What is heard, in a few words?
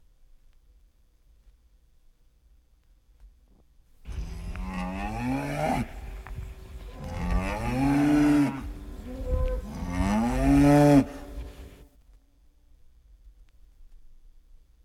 Cows Mooing; Jac Holzman; Authentic Sound Effects Volume 2